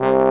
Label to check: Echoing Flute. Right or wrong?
Right